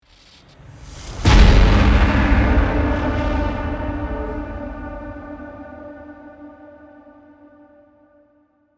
Dramatic scare impact suspense hit

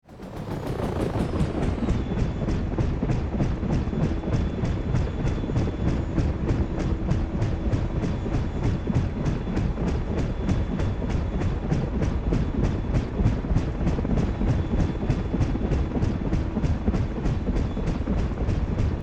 Helicopter blade fast to slow motion transition

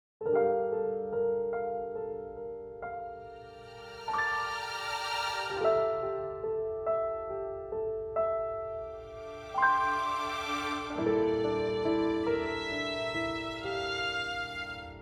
Game over you lose gentle romantic

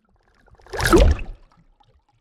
Cinematic underwater whoosh

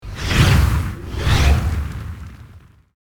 Fire magic spell double attack

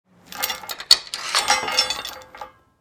Bicycle chain lock unlocking

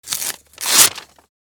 Fast paper tear rip quick

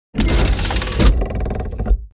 Chaotic steampunk impact with mechanical movement; old-world charm; gfxsounds

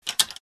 Clock mechanism replacement

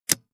Cassette tape recorder push play button

